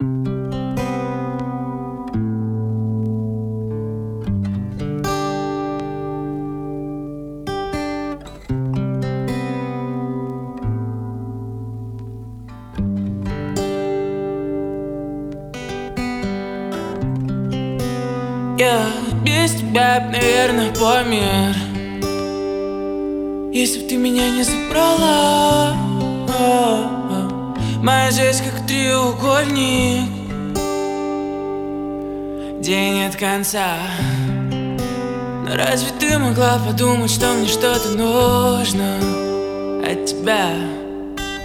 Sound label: acoustic